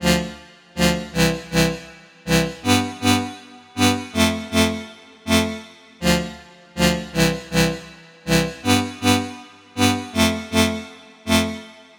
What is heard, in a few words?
synth chords 160bpm; looperman; hbsamples